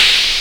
HH OPEN, Fresh Disco House; USB Soundscan vol.20 - Fresh Disco House I

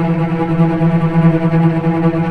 VCS TREMOLO, string; East West - The Ultimate String Collection

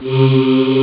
human string; ST-XX Amiga Soundtracker Sample Packs

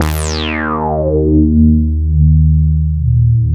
PROPHET 5 D#3, Synthesizer; Hollywood Edge - Giorgio Moroder Rare Synthesizer Collection

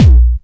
Kick, dry perc; Quasimidi Rave-o-lution 309

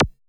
STEREO ZAP; HIQ+ZAPS

